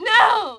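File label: bail vox female; skater; Tony Hawk's Project 8; XBOX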